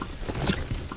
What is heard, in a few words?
car sit; Mafie 1